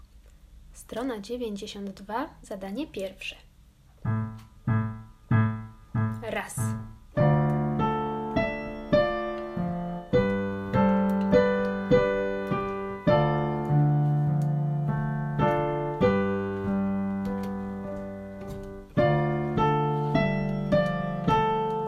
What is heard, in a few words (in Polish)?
rozpoznaj metrum